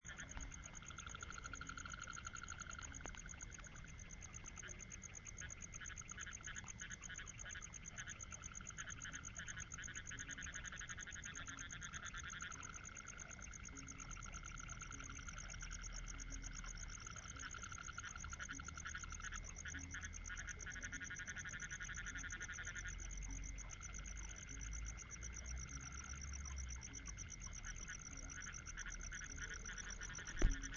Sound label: frogs, nepal; by fewa; The sounds of the lake at night were amazing!